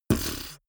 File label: beatbox bass drum wobble